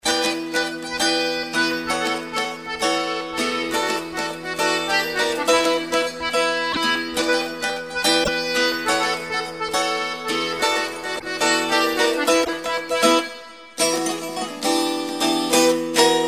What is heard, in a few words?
bourree, epinette; la Bourrée du P'tit Coq